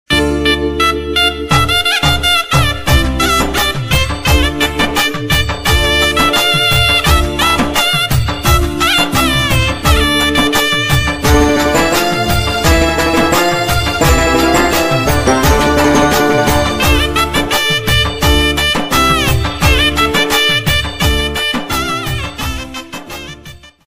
bhakti bgm ringtones music telugu bgms bhakti phone ringtones; ayyappa